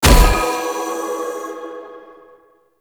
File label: Choir Bow Arrow Explosion; Warframe